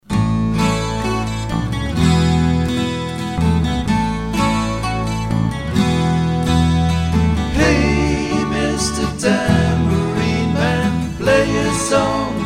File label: Yamaha 12 string